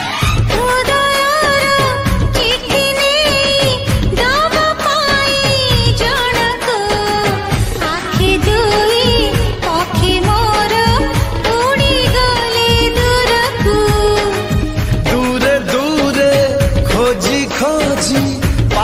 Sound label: Odia Song Ringtone